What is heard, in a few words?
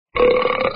beuuuurp